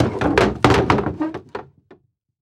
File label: Impact Chair Wood Chair Impact Scrape With Wood Floor